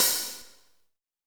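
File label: hi-hat, Real Mega Drums; Best Service; Real Mega Drums VOL-1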